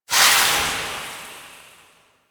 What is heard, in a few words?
Incarnon Projectile Death Small; Weapons; Warframe; Duviri; Incarnon Adapters